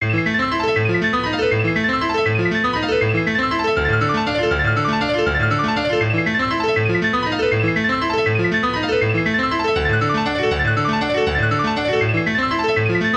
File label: piano